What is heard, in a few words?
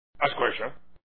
a Squish; trogdor